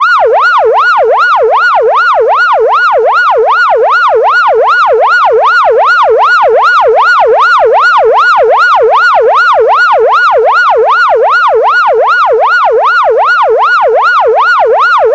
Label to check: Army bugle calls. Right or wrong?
Wrong